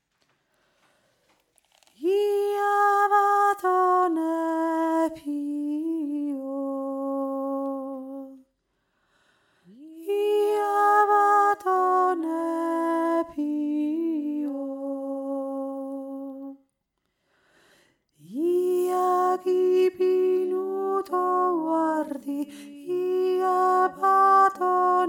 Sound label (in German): hauptstimme; ia batonepi o